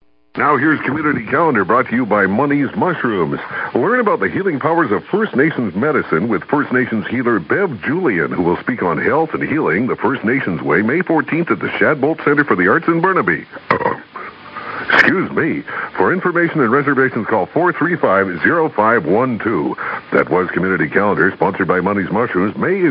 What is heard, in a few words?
burp